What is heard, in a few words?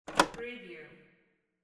tape deck button